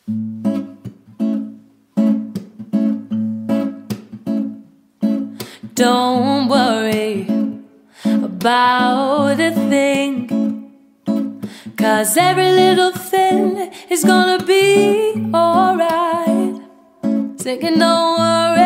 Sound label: Live Lounge